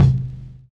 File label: VINYL BD, Drums Dance & Groove